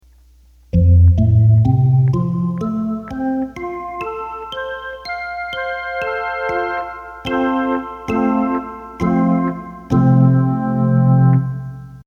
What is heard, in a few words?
Theatre Organ